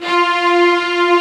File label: Section String F3; Proteus 2000; Digital Sound Factory Proteus 2000